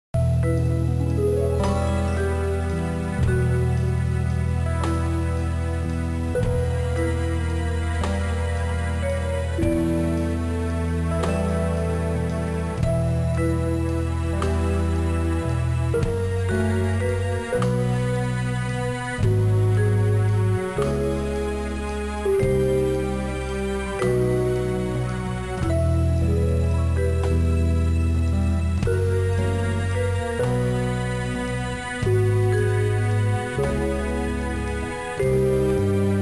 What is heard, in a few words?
Music Underwater